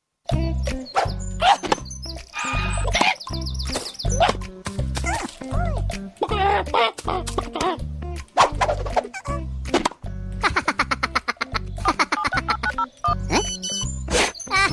Hen And Phone

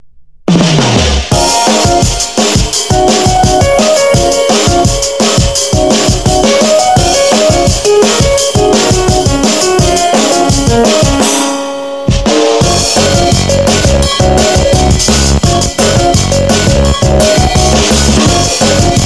Juke Box

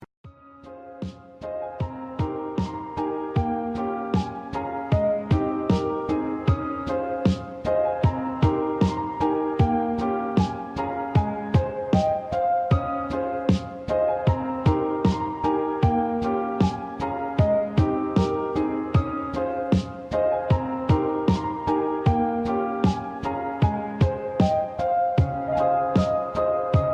Lo-Fi Pop; Undertale

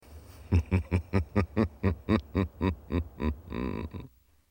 Evil Laugh

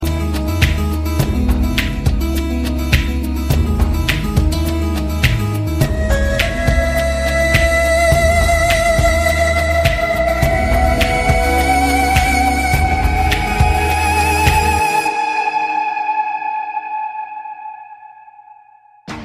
Sms Tonne

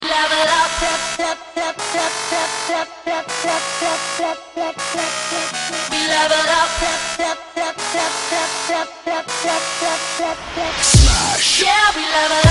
Level Up

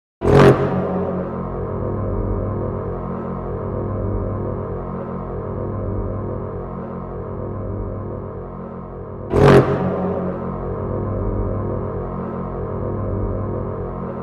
Horn; Horn of Helm Hammer

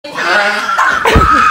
laughs at library

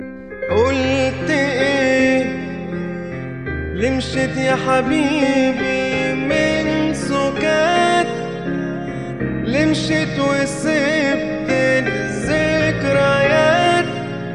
Romantic Song

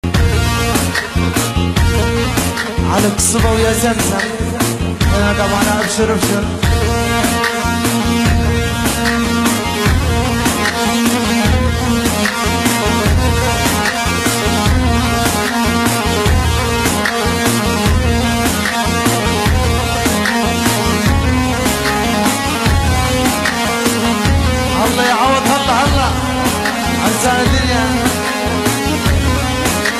Arabic syria

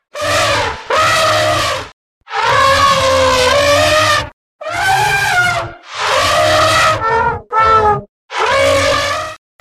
Elephant Sound Sms

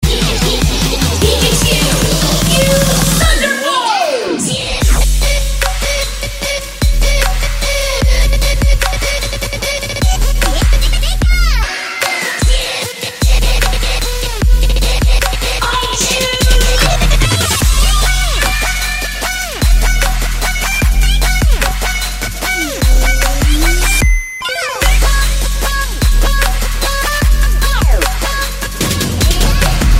Trap; THUNDERBOLT

